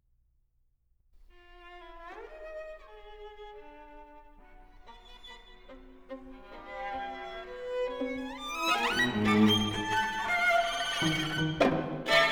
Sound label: String Quartet, Ziemlich fließend; Six Bagatelles for String Quartet, op. 9